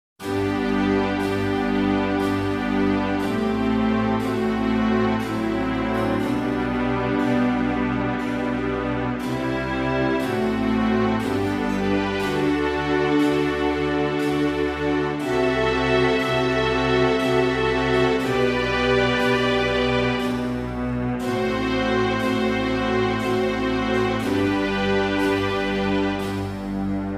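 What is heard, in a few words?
strings G major instrument; ombra mai fu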